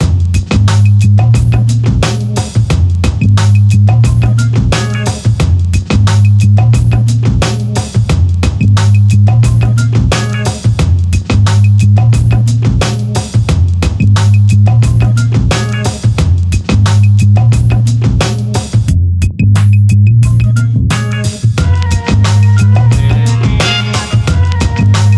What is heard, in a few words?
funk